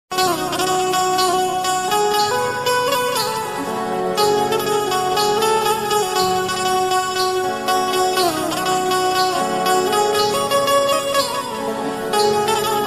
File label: ringtone